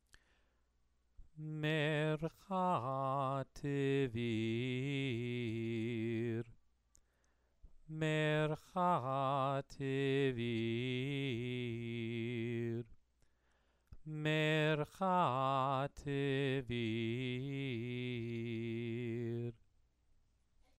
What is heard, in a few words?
Megilat Esther exercise